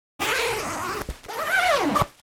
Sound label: CD and DVD case with zipper opening closing